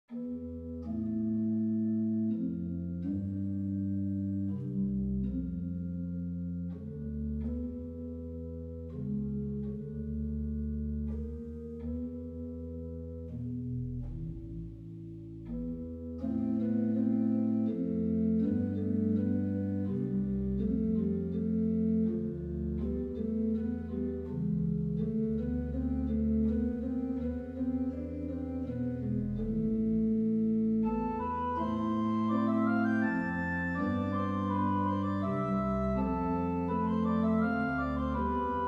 piece facile passacaille, organ; Mostoles

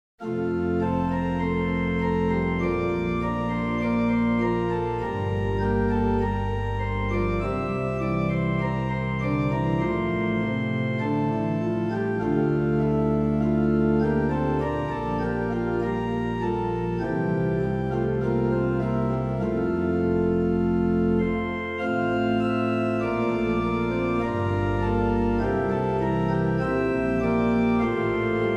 priere en Fa, organ; Mostoles

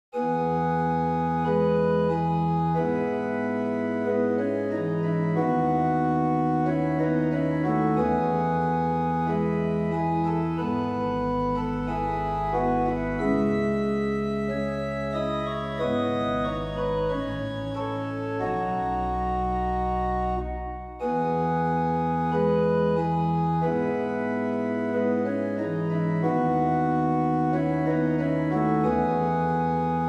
Noel en Mi majeur, organ; Mostoles

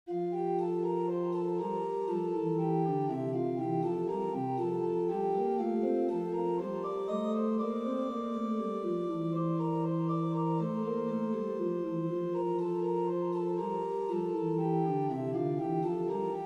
Prelude en Fa, organ; Mostoles

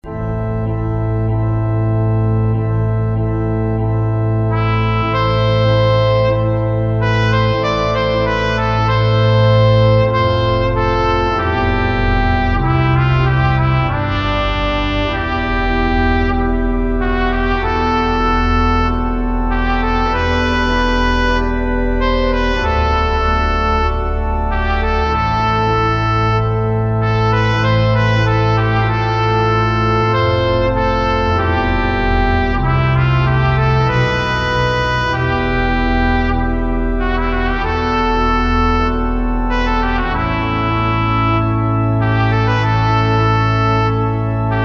triste marche reverb, organ

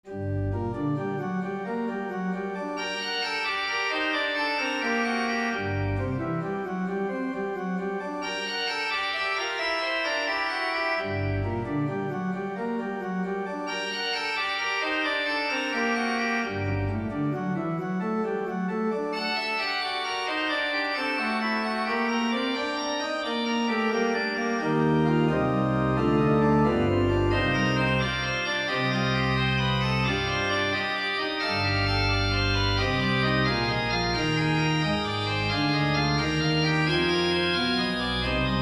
piece facile, organ; Mostoles

